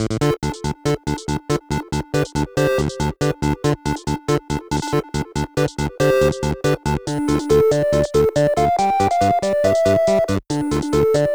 Training Arrange (Chiptune)

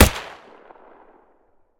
Gun Semi Auto Rifle Shot Last With Tail

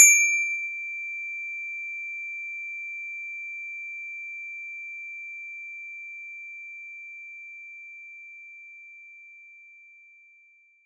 DX7 Strike; knights try

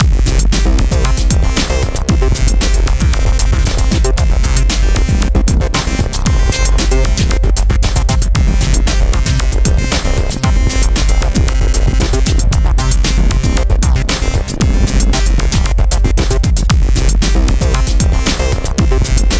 Menu; Tank Beat; DS gamerip 2006

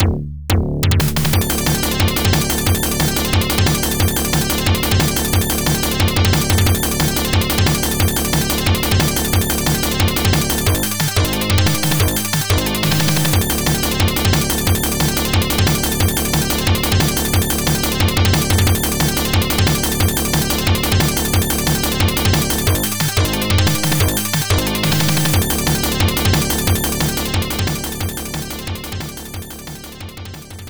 Unused (Arcade Continue); Captain America and the Avengers; Genesis

